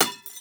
glass hit

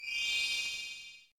SFX - Tada; Master of Illusion

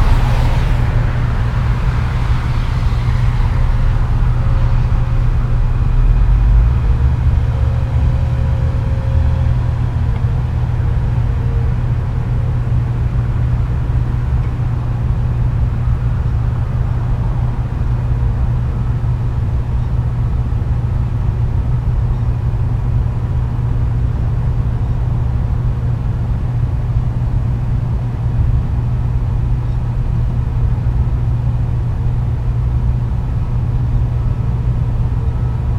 World Day Ground

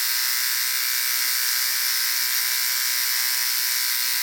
Tattoo Removal LOOP; PA DLC GANGS

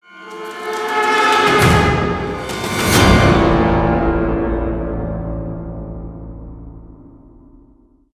Combat Intro

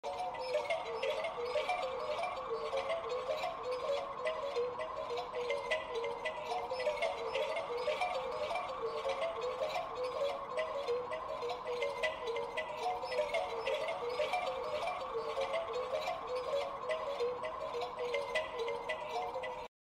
High Insanity Music Box Loop

